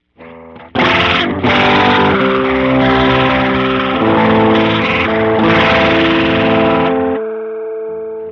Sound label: Rotary Mod MIX 100 HORN 20.00Hz